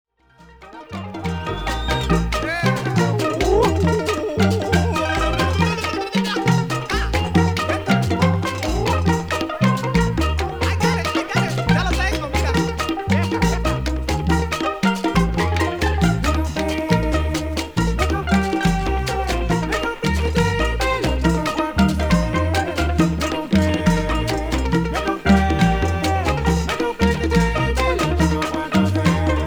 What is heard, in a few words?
cumbia